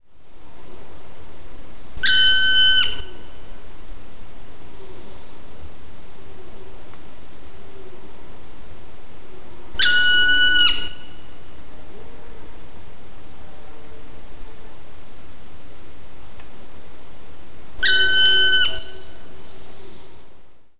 fekete harkály kiáltás

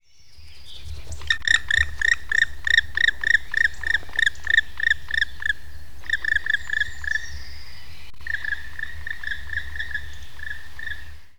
fekete harkály röphang

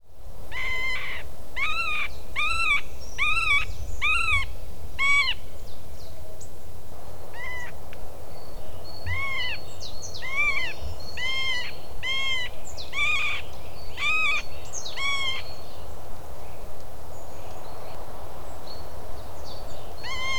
közép fakpáncs nyávogás

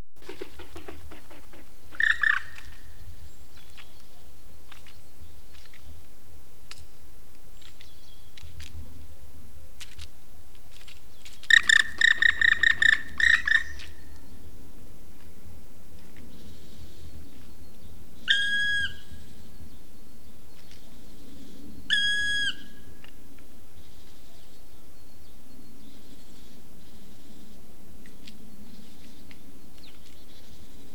fekete harkály szárnysuhogás röphang és kiáltás

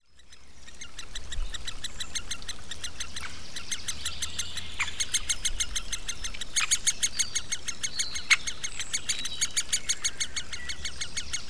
nagy fakopáncs fiókáit eteti

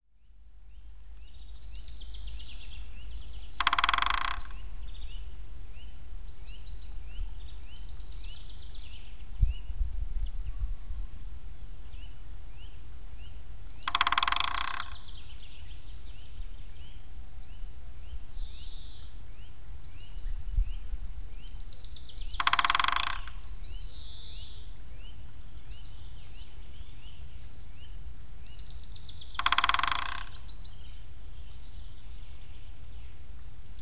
közép fakopáncs dob

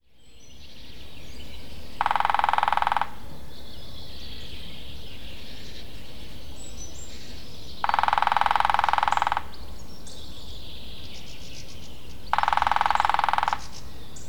kis fakopáncs dobolás

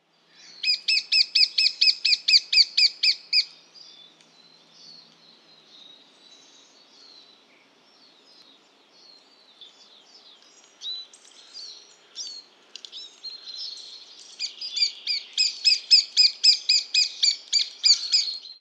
D.minor kiáltás; G. Gorman

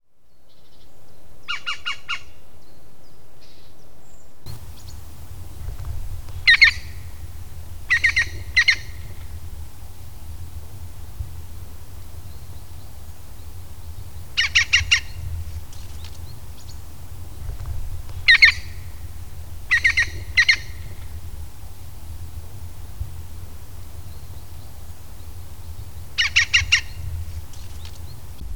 zöld küllő kapcsolattartó hangja és kiáltás

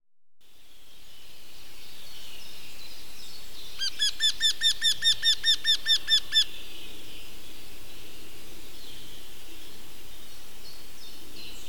nyaktekercs kiáltozás

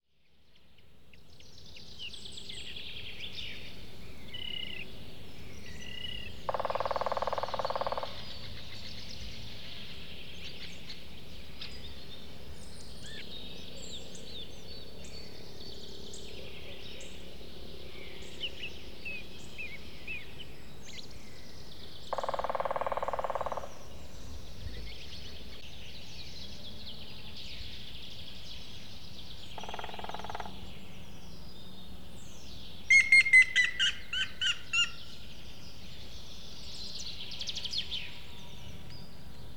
dobolás és kiáltás

